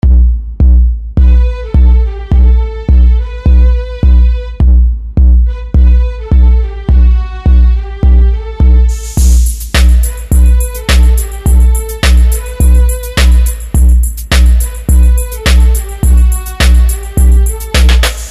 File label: RAP Playback; kidsclub; Psalm 50,15